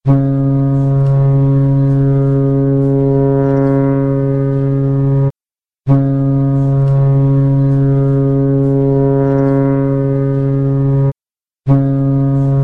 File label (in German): lang lang kurz, schall